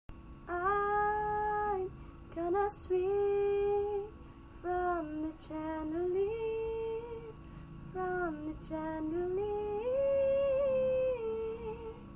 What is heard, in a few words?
the high note